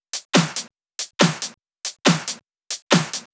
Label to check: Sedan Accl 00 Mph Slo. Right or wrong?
Wrong